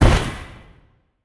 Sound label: Victory Poof; smite; izanami